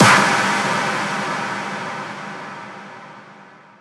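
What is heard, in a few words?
impact clap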